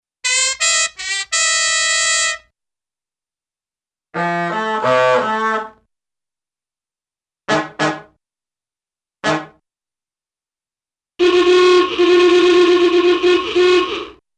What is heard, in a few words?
Car Horn - Musical, Cartoon; Hollywood Edge; Cartoon Trax